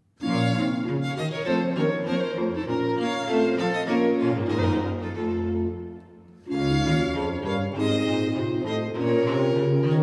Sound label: Sonate 8 in C minor - 2. Allegro; Archangelo Corelli; Arcangelo Corelli; Corelli - Complete Works; Klassika